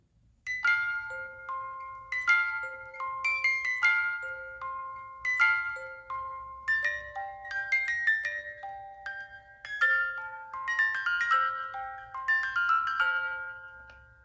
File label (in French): 18 lames manivelles, boite musique